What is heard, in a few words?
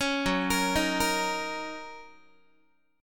Gb 6th Gb down Guitar; Listen to Gb6 arpeggiated